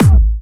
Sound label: Harder, kicks